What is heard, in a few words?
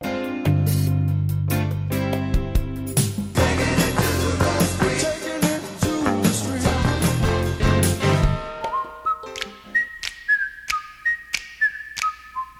whistling; can you whistle